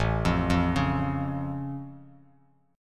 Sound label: A Augmented A down Bass Standard; arpeggiated; JGuitar